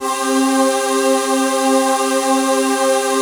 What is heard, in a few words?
VOICE PAD; Best Service; XX Large; Pads